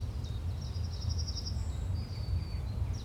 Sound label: birds 1shot; ambient; animal; Counter-Strike Global Offensive